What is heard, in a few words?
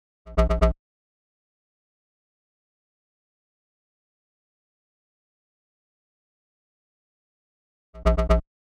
125 BPM A# Min Bass Fill; Melody Loops; Loop Stems; Cymatics; Antidote